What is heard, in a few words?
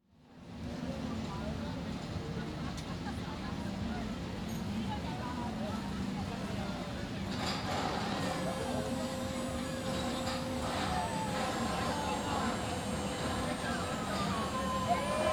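Fairground, exterior atmosphere ambience with people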